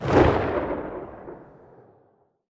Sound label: atk tail; Titanfall 2